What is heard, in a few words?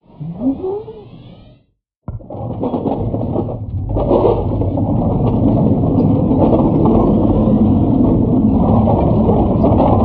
Dock To Balcony